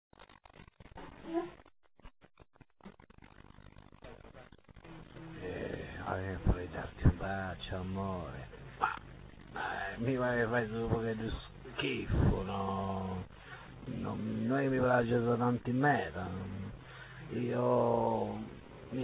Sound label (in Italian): bacio